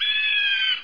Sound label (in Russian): hishnij krik yastreba